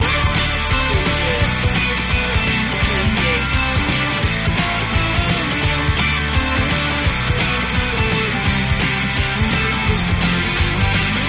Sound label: invigorating loop